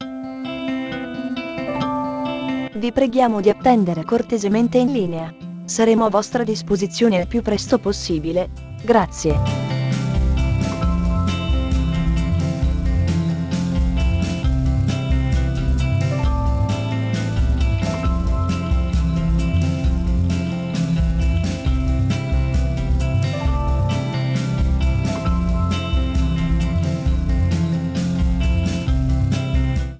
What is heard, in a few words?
Ambient Ita